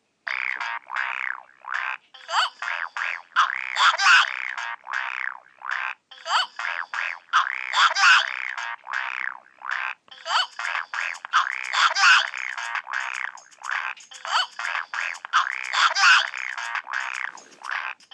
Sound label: Kaossilator 2 sampling a 3 yr old s vocals